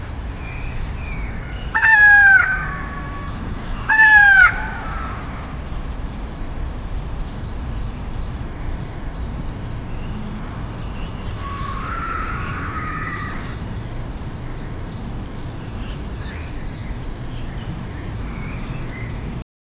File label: birds on an old corn